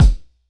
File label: Pound Aggro, Kicks; Jomox; Puppy; Airwindows; Dimensional Jomox Kicks